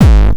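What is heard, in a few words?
909 distorted, DeepSky Drumbox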